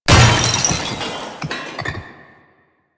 Loot Crate Medium; Entrati; LootCrates

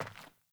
Sandals Walk Metal, Footsteps

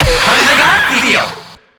Infested Lich Pain C; Zeke

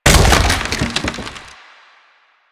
Wood Bench Destroy; Ambience; Props; Warframe; Nineteen Ninety Nine

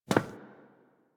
NPC Step Default, Footsteps; Warframe; Friendly NPC

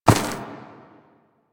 Ram Step Walk Grass; Animals; DE; Warframe; Quests; Duviri

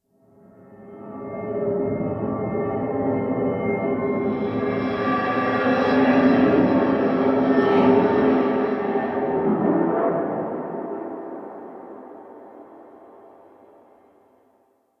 Metallic Drone; Warframe; Ambience; Nineteen Ninety Nine

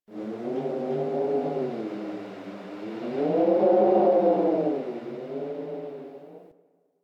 Landscape Cave Wind Howl, Ambience; Warframe; Venus Open World; One Offs

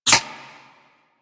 Ricochet; Warframe; Cowgirl

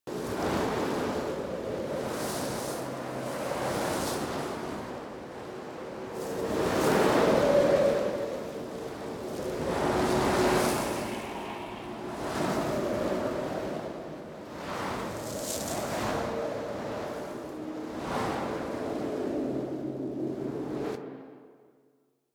Landscape Wind Medium Loop; Warframe; Ambience; Venus Open World

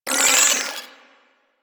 Flare Impact; Warframe; DE; Sentients; Sentient Trooper

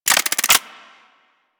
Hand Shotgun Chamber Spin; Grineer

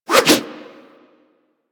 Axe Small Spin Swing; Warframe; Weapons

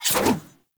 Dagger Throw Whoosh; Weapons; Enemy; Daggers; Sinecure Dagger

